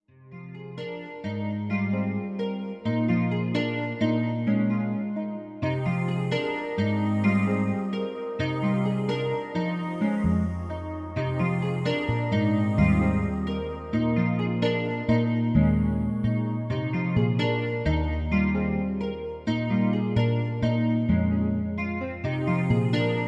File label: beacon, urban nature